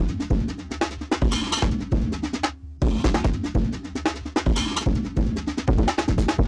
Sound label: 148, LOOPS DRUMS; LaCroix; RoBKTA Sample Pack Supreme